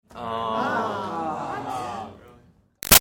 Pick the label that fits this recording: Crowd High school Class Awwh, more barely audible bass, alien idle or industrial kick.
Crowd High school Class Awwh